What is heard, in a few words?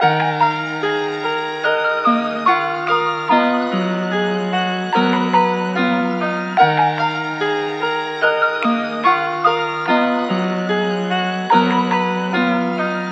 146 BPM Ebm